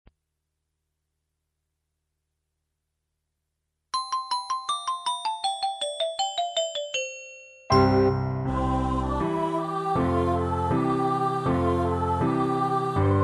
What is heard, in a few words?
christ music